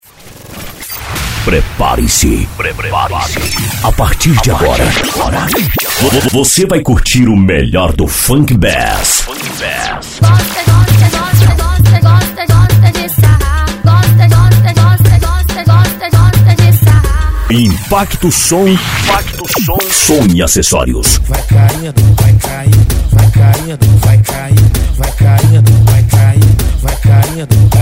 funk bass; impacto som

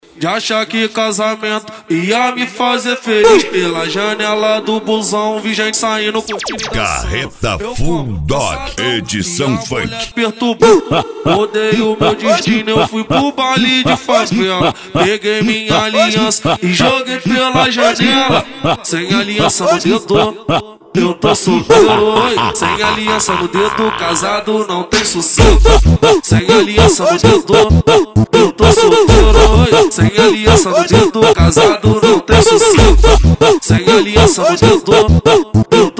funk

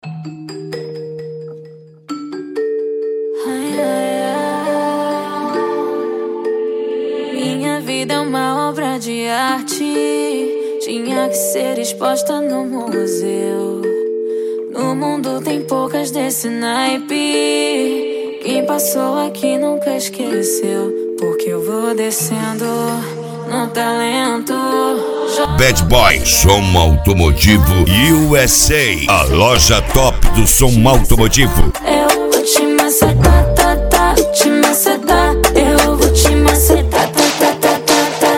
especial funk; cd bad boy som automotivo usa

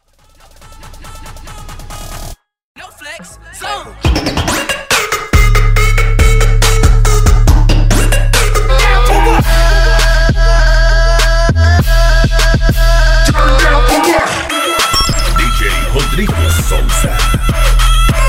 trap remixes

